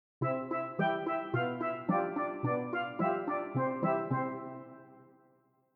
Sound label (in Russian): milyiy i jivoy nevinnyiy yumoristicheskiy zagolovok animatsii fonovogo zvuka